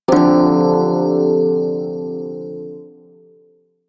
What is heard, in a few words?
Prime Shawzin Maj Chord D; Music; Warframe